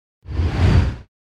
Whoosh By Air Classic Passing; Hollywood Edge; sound designer's toolkit v2

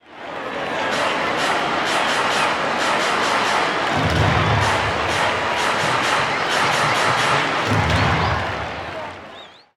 Baseball Organ Crowd Claps; Crowds

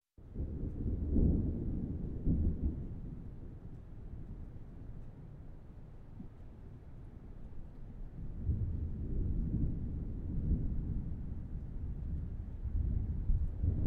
Thunder Rumbling In Distance; Sound Ideas 2000 Series

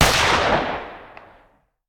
Hand Gun; Sound Ideas; Guns

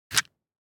DICE on Felt, Grab, Standard, 6 Six Dice; Samples; ArticulatedSounds; DICE Sfx Party (Extended)

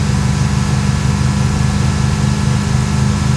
Humvee Loop; Trucks

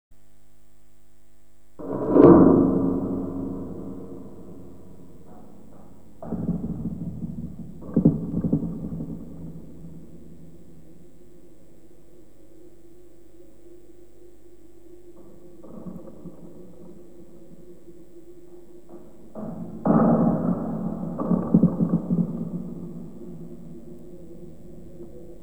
Halloween Songs - Halloween - Scary Sounds; 1001 Sound Effects; Halloween Scary Music and Sound Effects

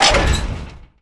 Door Large Metal Stop; Doors

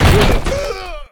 Football Hit Tackle

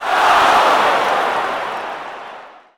Football Crowd "Oh"; Crowds

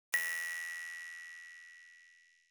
Button Spring Small Springy Button Beep; Hollywood Edge; sound designer's toolkit v2

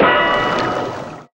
Pickup Underwater O2 Tank; Pickups

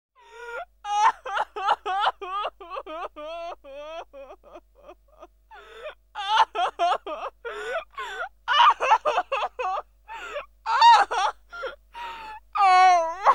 Woman Sobbing; KMP Film Video Sound Effects

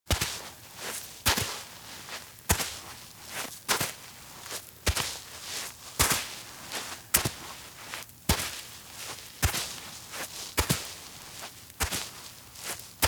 Bare Feet Walk at Slow Pace on Sand; Sound Ideas; Footstep Sound Effects

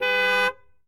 horn car; Blind Drive

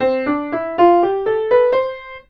Piano Play Scale Up; SFX Kit; Foley - Misc

